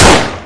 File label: revolver gunshot; roulette